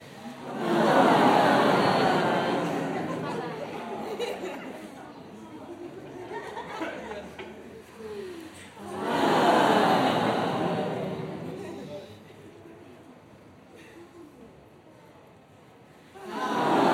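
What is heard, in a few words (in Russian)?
восклицание люди массовый мягкий приглушенный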